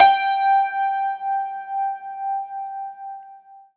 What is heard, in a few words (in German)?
HACKBRETT G2; Alpine Volksmusik